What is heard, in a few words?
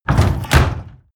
mechanical lever wood medium down; industrie-wirtschaft; mechanik